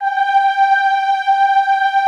G4, Classical Choir; Best Service; Extended Classical Choir

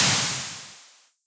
Cymbals, Elektron MachineDrum

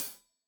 Hat Tight, Hi-Hat; Deeply Sampled Drums

